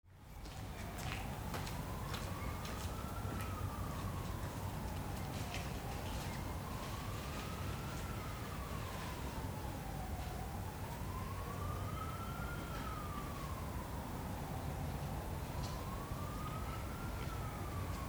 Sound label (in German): Bergen weiss Ton